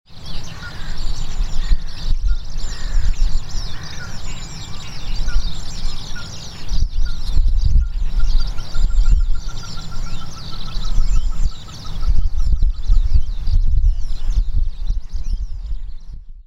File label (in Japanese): ヒクイナ